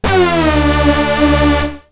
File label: hoover